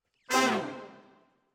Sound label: Sax